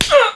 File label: human pain; theodyssey